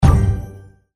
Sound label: hammer land; Crystal Furnace